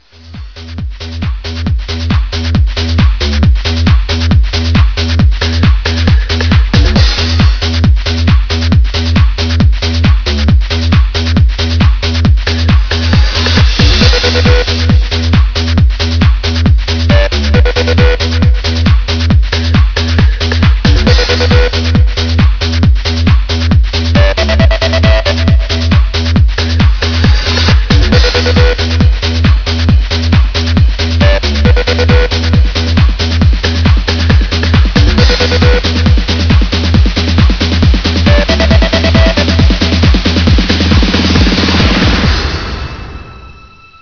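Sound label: guitar; es esperenza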